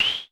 KLONE NOIZ, Klone Dual-Percussion-Synthesiser, HHAT; Drum Machines